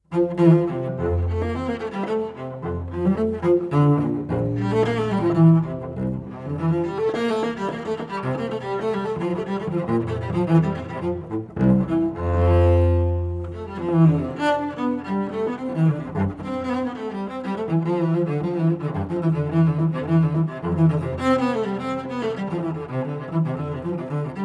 Courante

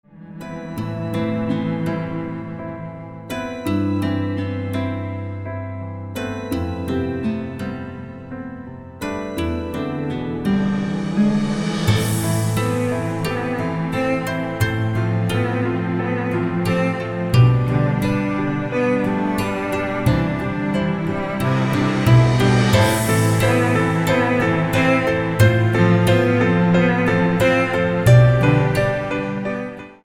Instrumental

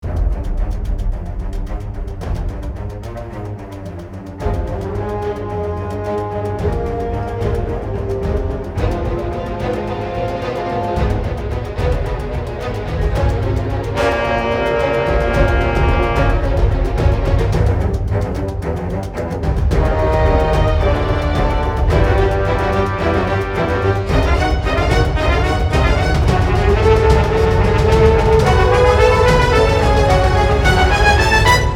Chamber Orch

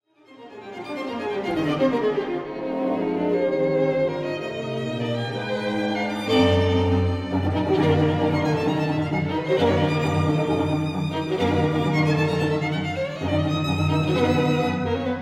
String Quartet in E minor B major; Panocha Quartet

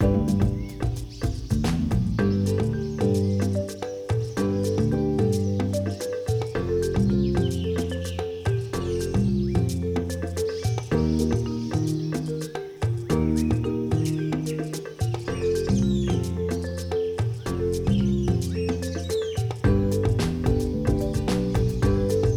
Jungle tropical video game

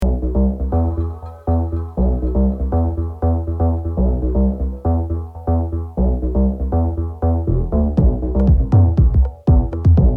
New Age

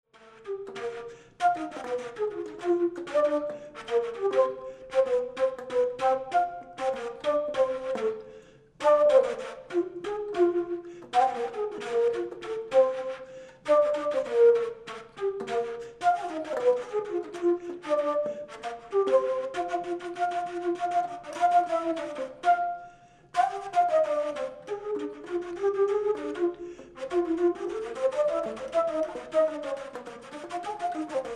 Latin Feel Blues

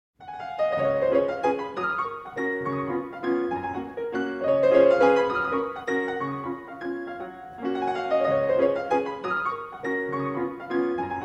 Waltz G Flat Major, Posthumous; Chopin

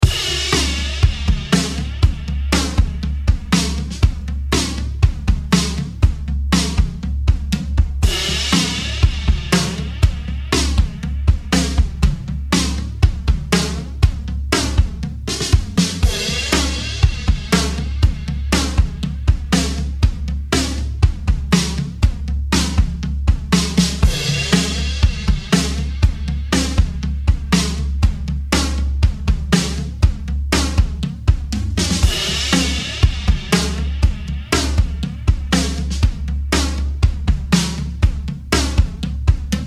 Drums

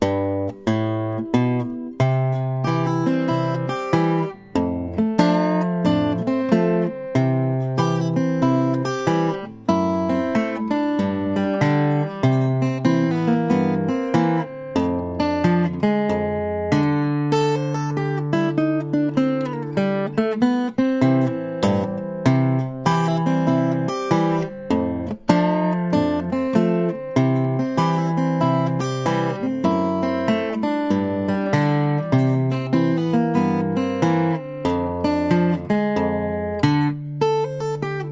Saturday night shuffle slow

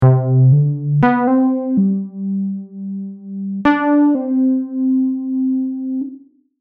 jingle test

